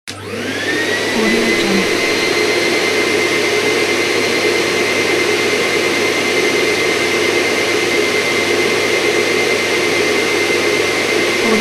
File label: industrial vacuum cleaner; davidbeardmusicproduction